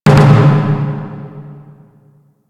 Dojo Cannot Build, UI; Warframe

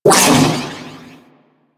Crowd Dispersion Cast; DE; Warframe; Weapons; Sentinel; Abilities

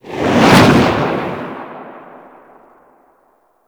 Infested Golem Hand Swing; Warframe

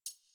Computer Room Beep Misc

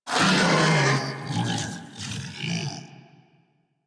Kubrow Armored Vocal Death; Enemies; Warframe

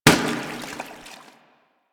Water Balloon Impact; Warframe; Water Balloons

